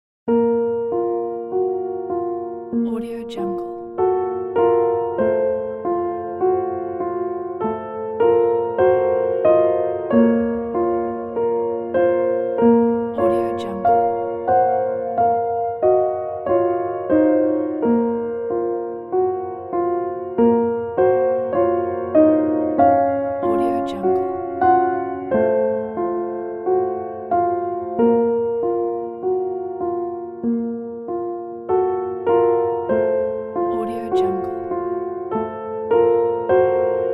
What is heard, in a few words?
sad piano